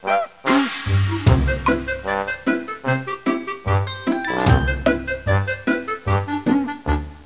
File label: comic; MOBICLIC 13